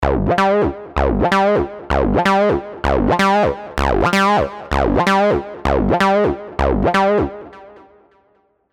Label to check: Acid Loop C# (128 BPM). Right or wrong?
Right